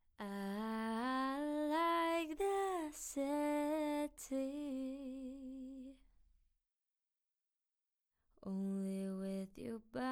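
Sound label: Pop, 80, C major, Acapella; Female; Singing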